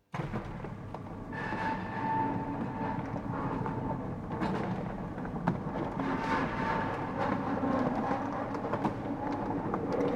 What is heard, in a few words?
Industrial Mechanical Electric Door Large Close Perspective Closed Variations